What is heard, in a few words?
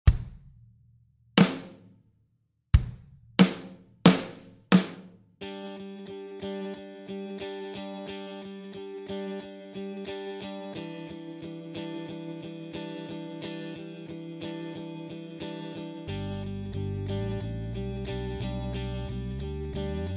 Guitar backing